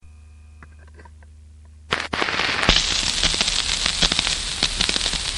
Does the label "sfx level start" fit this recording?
Yes